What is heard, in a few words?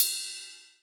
Cym Edg Bell; Alesis D4